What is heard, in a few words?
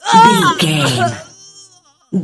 voice female death